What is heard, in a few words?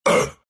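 pain, Russian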